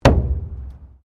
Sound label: Wood Panel Thump; Material components for constructing more complex sounds; Sound Effects:Materials